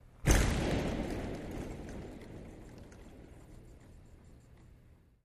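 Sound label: Hockey Body Hit Reverb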